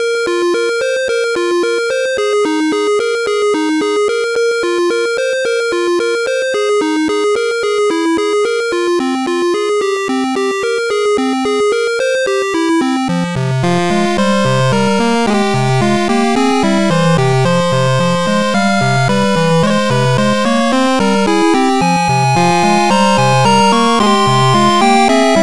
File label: 8bit loop